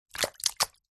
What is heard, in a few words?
foley wet sloppy slappy slops could be fish or cloth